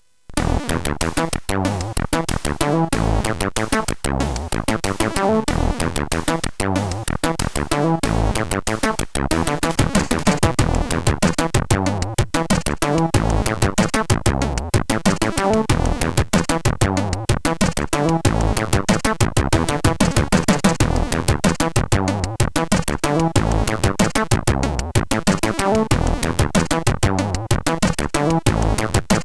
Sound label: FUNK, CSG8580R5; FUNKAN